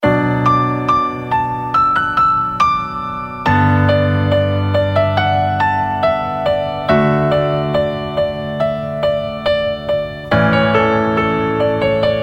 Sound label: 130 Melody Piano